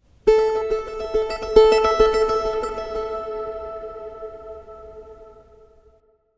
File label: Dark Tension, Sting